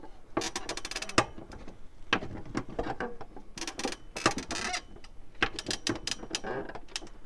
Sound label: Squeaks Not Touching